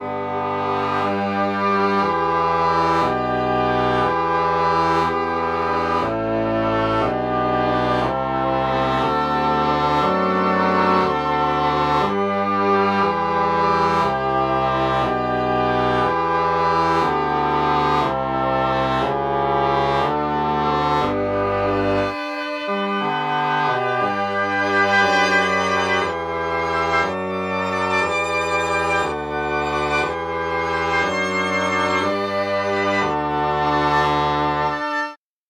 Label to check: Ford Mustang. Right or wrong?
Wrong